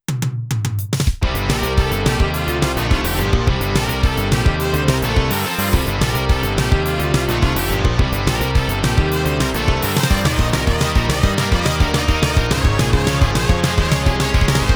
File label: Boss, SC-88; Hisyou